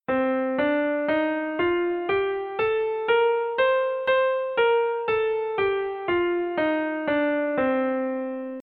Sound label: Kafi Aroh Midi